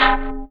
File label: Perc OneShot, Processed Percussion; One Shots; Sonic Foundry (Sony Creative Software); Rhythmicronics